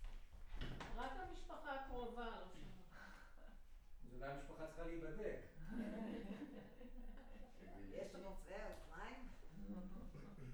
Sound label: Room, Choir